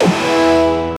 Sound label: super hard suspense hits